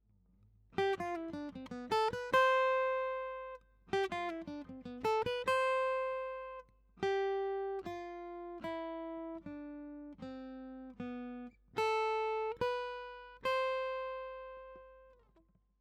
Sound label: Natural Minor Lick, guitar